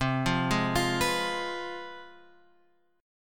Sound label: C Major 7th Suspended 4th Sharp 5th, guitar; CM7sus4#5 Chord {8 8 6 X 6 7} arpeggiated